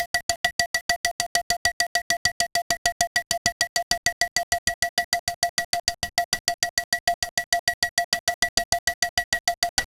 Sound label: balkan pluck rythm; ethnic